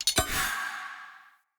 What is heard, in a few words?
Galene Staff Caster Equip, Weapons, Staves; Player